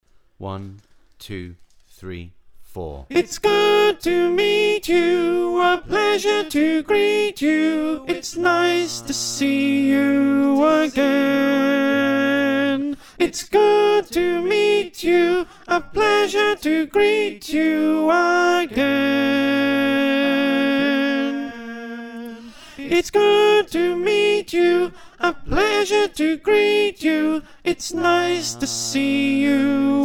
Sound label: Soprano, choir